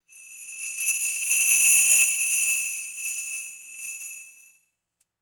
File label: soft reverberant sleigh bell swells